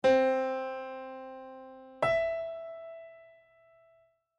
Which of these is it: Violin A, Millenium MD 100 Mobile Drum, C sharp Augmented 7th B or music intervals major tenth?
music intervals major tenth